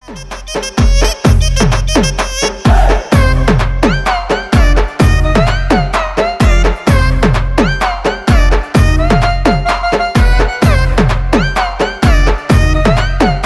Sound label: instrumental; Pushpa